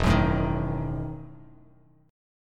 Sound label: E Major 7th Suspended 2nd Suspended 4th, Bass Standard; EM7sus2sus4 strummed